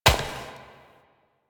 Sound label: Step Flesh; Warframe; Koumei